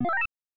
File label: start, 8 bit sound effects; Main menu